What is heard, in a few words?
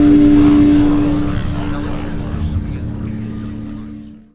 Ancient Gate Energy Close; Earth and Beyond; EA Games